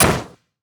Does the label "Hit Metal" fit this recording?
Yes